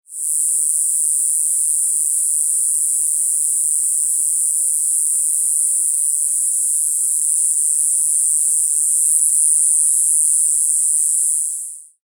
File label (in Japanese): kayakiri